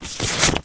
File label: money banknotes australian polymer hand out count hand; foley